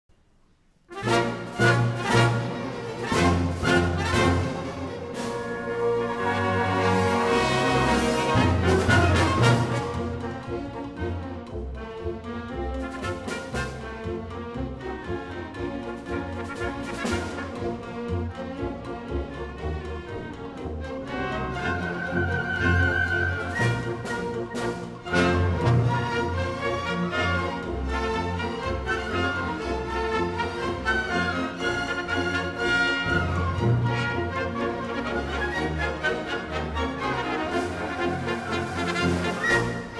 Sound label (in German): Alt Ukrainischer Marsch